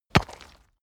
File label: stone plate drop